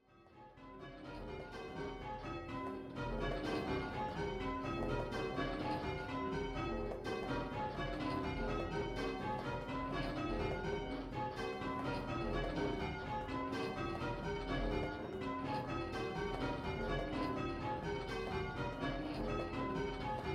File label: Major, bells